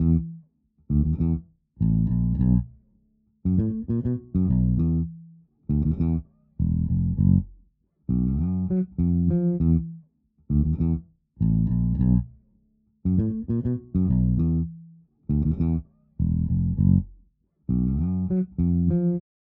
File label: FUNK GROOVE BASS COMPRESSOR OFF